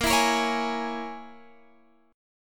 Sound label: Bb Minor 7th Flat 5th